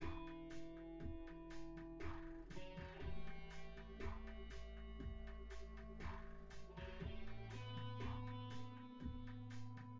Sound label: drums synth conv 1m sim; SASSEC07